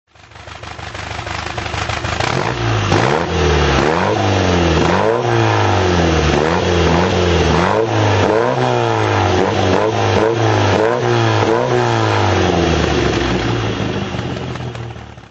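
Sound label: tr4 tuned throttle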